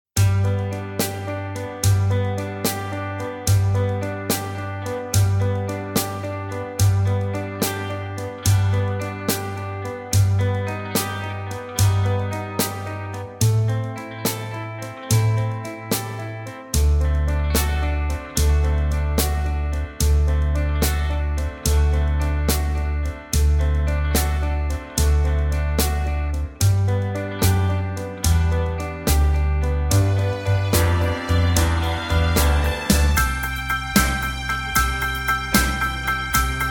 G No Backing Vocals, backing tracks

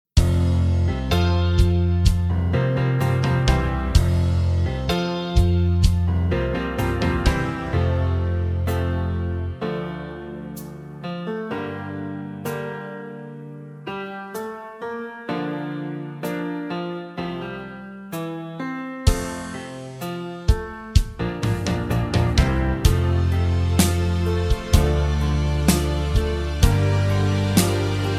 F To Gb No Backing Vocals, backing tracks

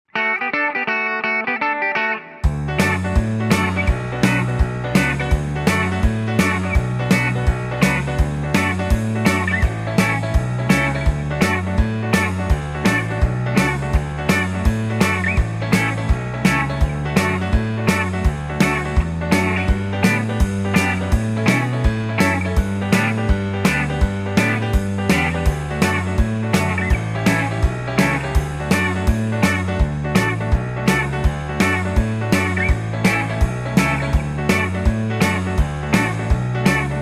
D No Backing Vocals, backing tracks

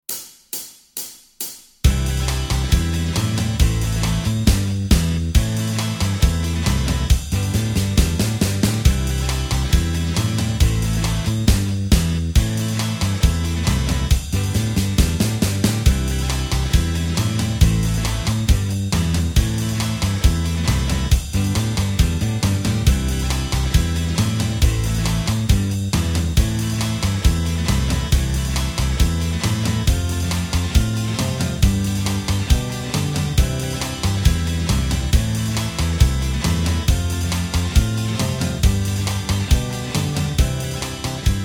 (C) No Lead Guitar

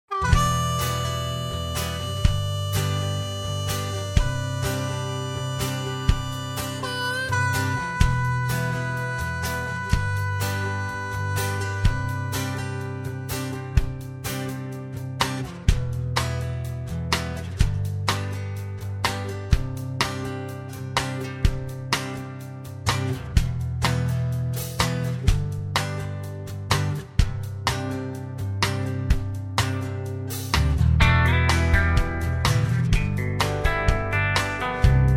(Em) No Backing Vocals

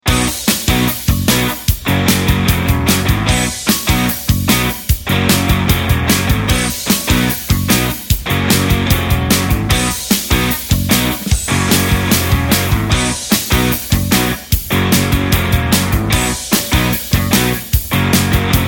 No Backing Vocals

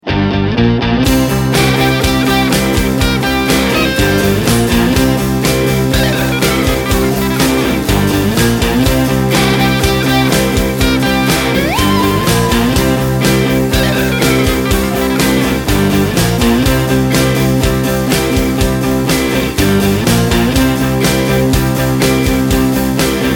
(C) No Backing Vocals

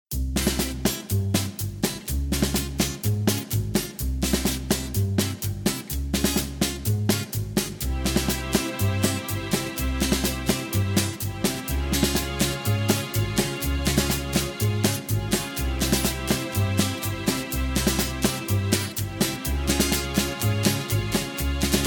C No Backing Vocals, backing tracks

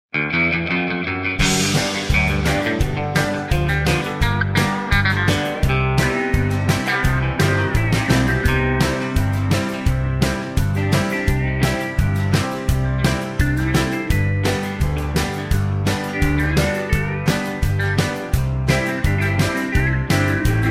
G No Backing Vocals, backing tracks

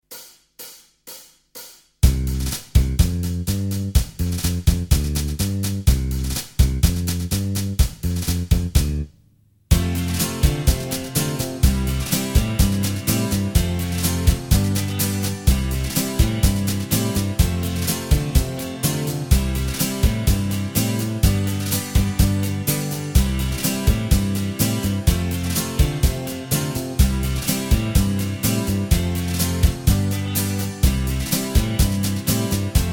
C No Lead Guitar, backing tracks

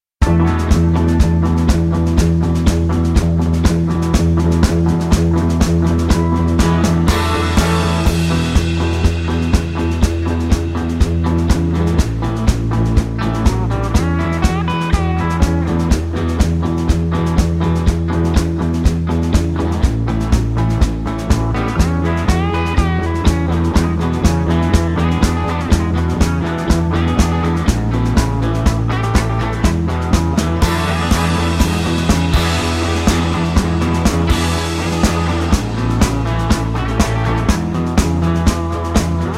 No Backing Vocals, (E)

